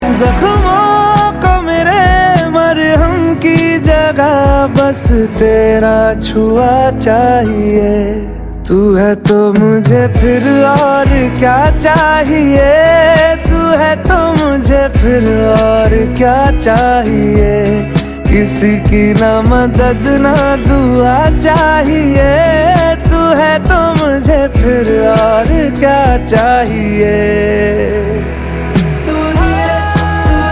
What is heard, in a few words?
Love song